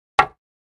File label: 2x4 Impact, hits